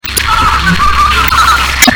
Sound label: chase; slender